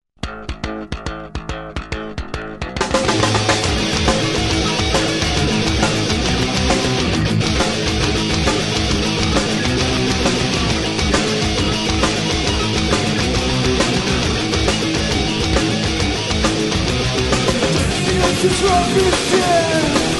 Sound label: blues